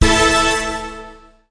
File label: disco combo; Ringling Bros. and Barnum & Bailey; Wii